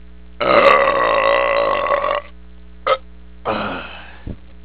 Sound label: tasty belch